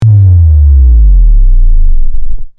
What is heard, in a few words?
impact bass; hyperframes